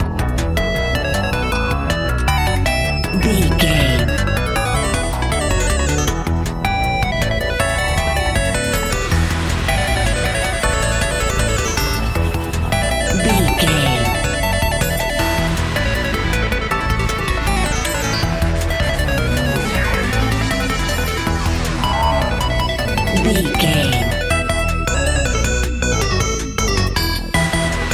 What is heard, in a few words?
epic video game adventure